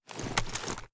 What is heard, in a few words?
kilo98 raise; viper